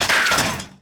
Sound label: damage hits explosions; World Of Warships